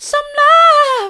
some love soft, vocal

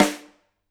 Rim, drum

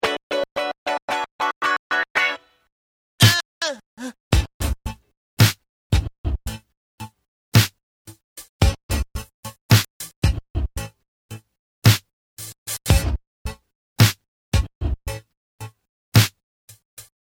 loop

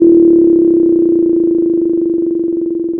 pop, Sound of a phone ringing

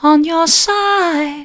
on your side soft, vocal

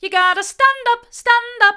you've gotta stand up hard, vocal

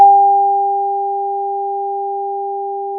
Sound of a school bell

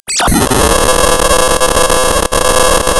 Sound of tearing paper, center

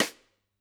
Sd St, drum

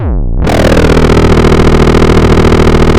pop, chainsaw

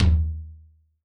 Tom, drum

